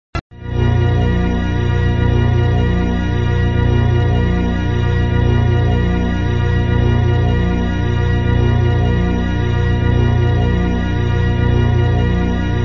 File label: Music, flipper; sttng